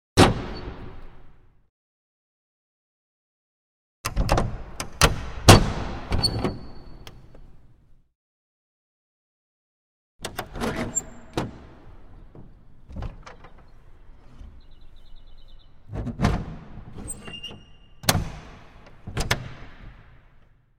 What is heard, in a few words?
Window With Bar-lock Mechani, Castles Palaces & Monasteries; Hollywood Edge Historical Series; cd4 Castles Palaces & Monasteries